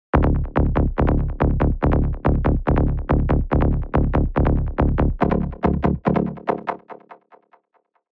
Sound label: Burnline, rolling bass; revealed serum 2 rolling bass vol 2